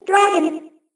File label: Dragon